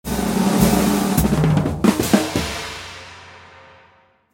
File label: Drums Crazy solo